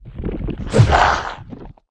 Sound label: bshark attack, monster